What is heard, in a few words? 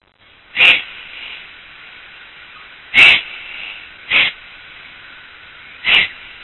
bubo bubo